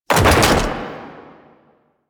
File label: Archgun React; Warframe; Archwing; Weapons